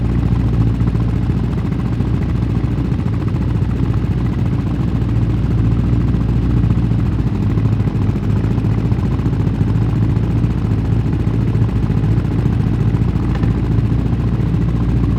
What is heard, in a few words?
Motorcycle, Engine Idle Loop; Warframe; Vehicles; Motorcycle Ninety Nine